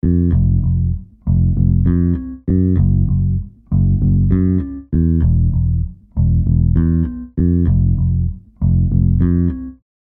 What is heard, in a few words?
Bass Pulse Grooves; Keyswitches